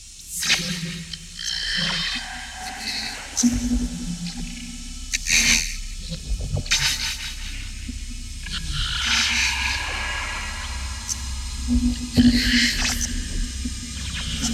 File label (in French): Vocoder phasing; Color synthese; Droles d oiseaux